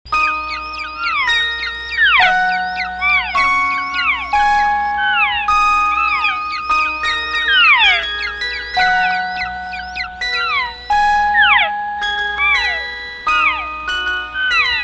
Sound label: cookout bells